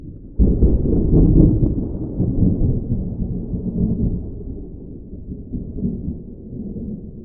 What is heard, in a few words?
Weather, Thunder, Tropical, Distant Thunder, Cracking Thunder; viewfinder